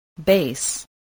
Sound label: bass